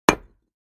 Patio Block Hit Sledg, concrete & brick

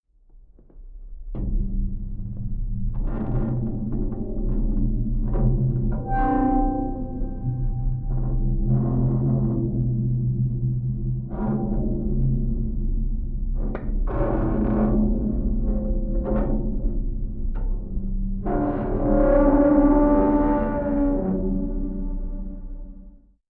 Underwater Metal Str, metal groan atmospheres; Underwater Metal Stress Ver 2

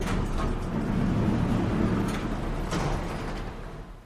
Elevator Door Open

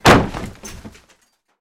Body Falls On Crate

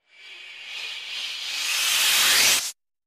Strange Reversed Air Releases Vary In Pitch

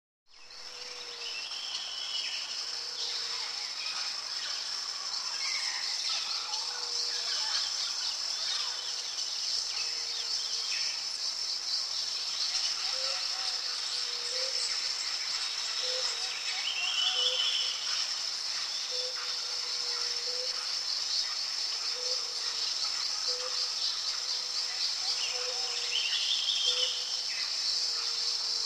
Jungle Dense Monkeys; backgrounds

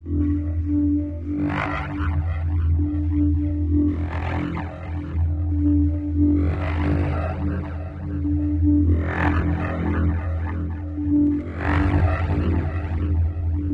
Crock Snore Med Breath, alien ambience

